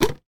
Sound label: foley bottle drink plastic sports lid off; sound effects one